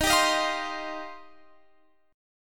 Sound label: D sharp 6th Add 9th, D sharp, down, Guitar, Open F; D#6add9 strummed